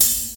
clean open hi hat a sharp key, open high-hat sample tuned to the A# note